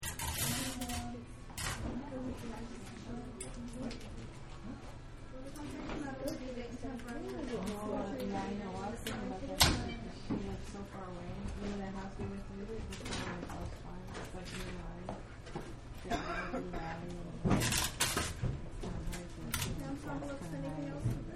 Post Office, Loop Ambience Of Post Office, Man Taping Packages, Background, Cough; Register Beeping; Stores and Malls